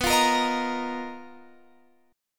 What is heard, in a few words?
B Major 7th Flat 5th B down Guitar Open F; strummed